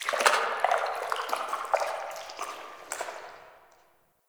Root Cave Sap Gush; Ambience; One Offs; Neathuns Root Cave

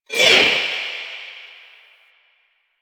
Choir Erupt Proj By; Warframe

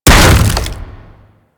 Hammer Impact Wood C; Warframe; Weapons

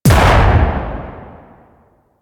Sniper Shot; DE; Warframe; Tenno; Quad Sniper

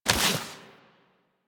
Investigate Pickup Folder; Warframe; Cephalon Weave

